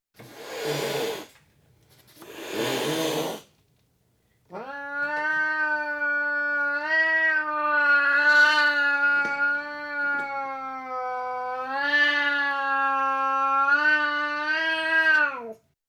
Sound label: Burmese Cat Hisses Twice And Long; Cats; BBC Sound Effects Library